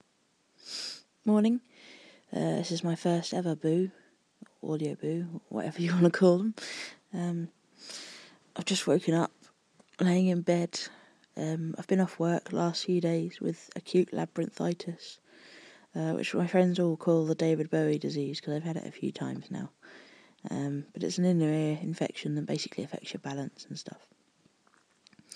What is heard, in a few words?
yawning